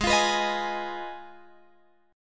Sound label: G sharp Minor 6th G sharp down Guitar Open F; G#m6 strummed